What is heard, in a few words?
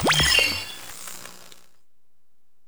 ui menu close; Half Life Alyx